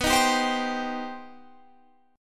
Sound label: C Minor B; Cm/B strummed